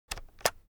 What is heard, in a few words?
office keyboard external shortcut medium; foley; smartsoundfx